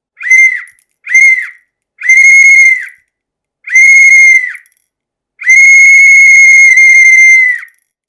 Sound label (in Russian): zvuk svistka